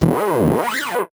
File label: VocoBit Noise, 8-bit; musicradar; 8-bit bonanza